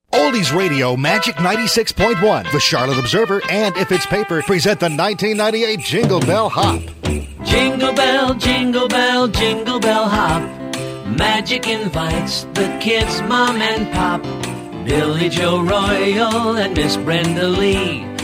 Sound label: Jingle Bell Hop